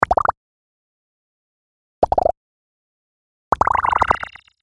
Âm thanh nổ bong bóng

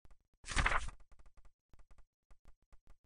Am thanh chuyen trang

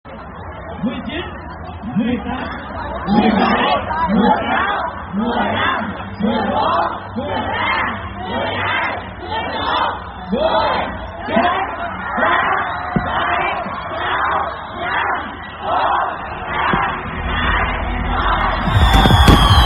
Tieng dem nguoc chuc mung nam moi va ban phao hoa

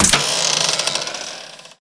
Tieng mo cua tu tu

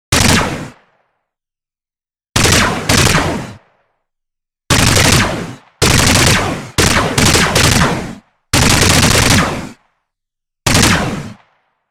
Tieng sung luc laser

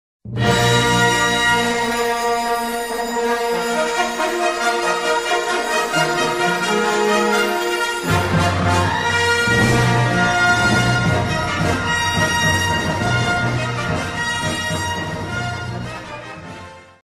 nhac mo man san khau

